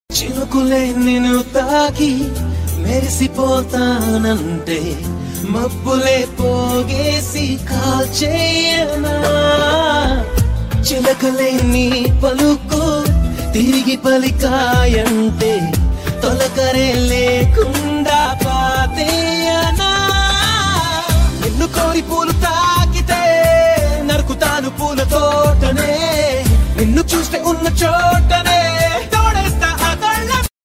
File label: love bgm; arya; allu arjun; telugu